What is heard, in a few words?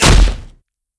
cbar hit generic